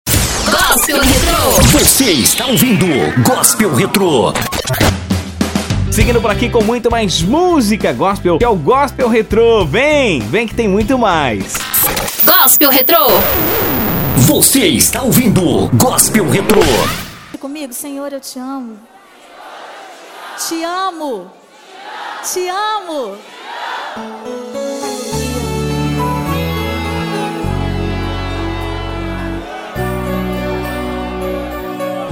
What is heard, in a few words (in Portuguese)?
Gospel Retro